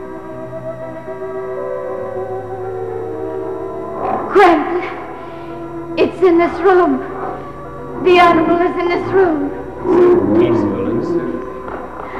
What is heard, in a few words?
peaceful